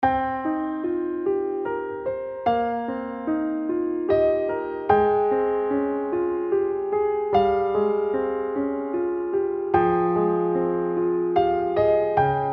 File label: piano solo